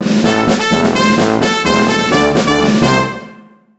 Jingle Military; Deal or No Deal Special Edition; NDS

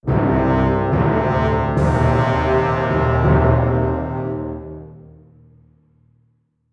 jingle neutral

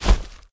Footsteps

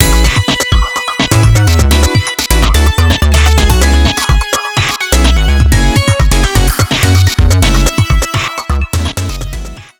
BGM SHOP; Busou Shinki Armored Princess Battle Conductor; arcade; BGM THUMB SHOP

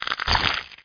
alien egg open

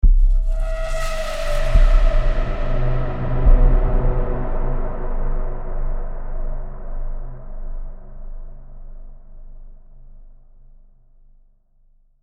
Stinger, soundtrack

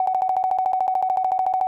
Beep Targeting Loop

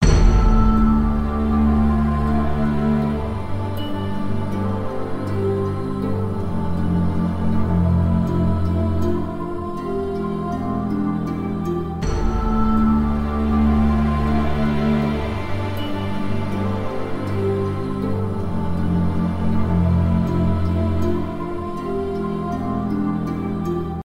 Silence Gameover; Dungeon Lords MMXII

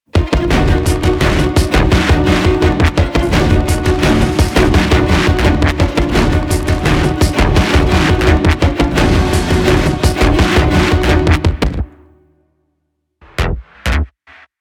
Cinematic Carpark Spotted; Get Even

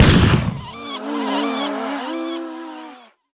Diamond Explode

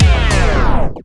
DJ Disco Stop; plants vs. zombies battle for neighborville; ps4 switch windows xbox one gamerip

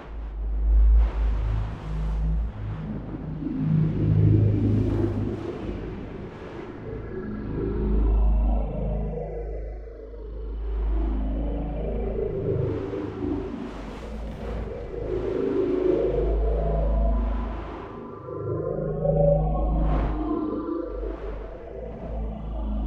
Parasite Star Idle; we are the dwarves gamerip 2016

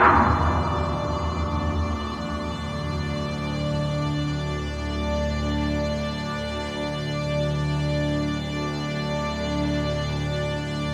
Transmat beam up, Music; DW5 EP 4; MUSIC MIX extract

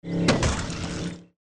Car Crash Sound Into The Tree At High Speed; Dude Theft War

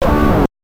Guitar Riff

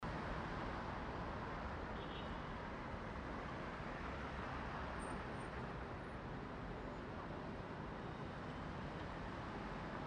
Exterior Ambience

